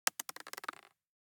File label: DICE on Plastic, Throw and Roll, Mini, 1 One Die; Samples; ArticulatedSounds; DICE Sfx Party (Extended)